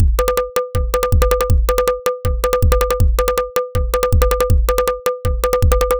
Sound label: dhol